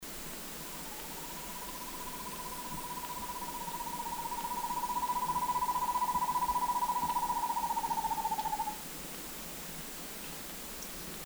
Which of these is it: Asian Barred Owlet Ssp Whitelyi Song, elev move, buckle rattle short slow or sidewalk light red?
Asian Barred Owlet Ssp Whitelyi Song